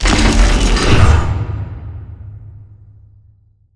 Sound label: Glitch Terrain; Pre Ash Fight; Titanfall 2; Boomtown